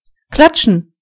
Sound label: clap; gcompris